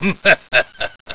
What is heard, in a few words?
Evil Laugh; Evil guy laughing; Zelda